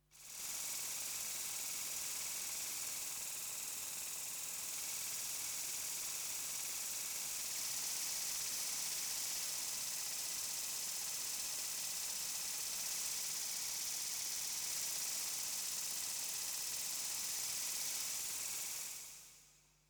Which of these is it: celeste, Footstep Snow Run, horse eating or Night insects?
Night insects